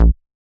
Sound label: Squarehead Bass A#